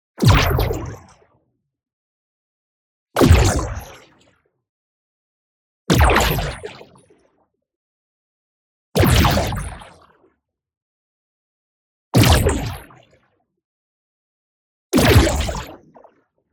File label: IMPACT SMALL Plasma Blast; boom library; Matter